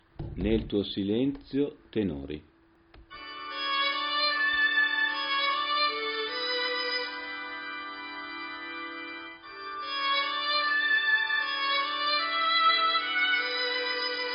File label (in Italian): Tenori; 200 Nel tuo silenzio